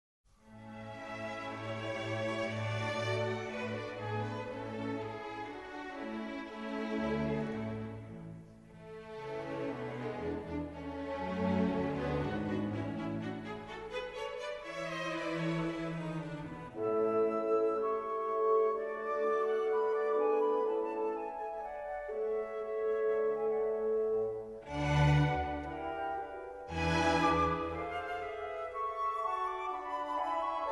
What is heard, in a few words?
piano concerto a major; mozart; k488